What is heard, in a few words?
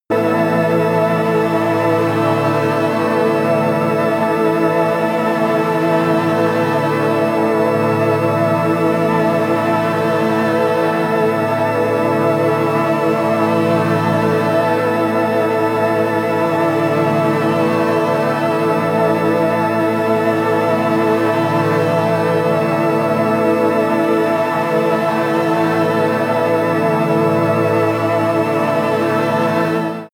tense organ